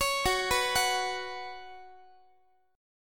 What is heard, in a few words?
F sharp Suspended 4th, Guitar; arpeggiated; x,x,16,16,15,17